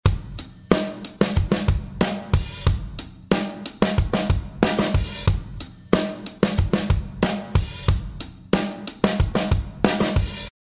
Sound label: Compressor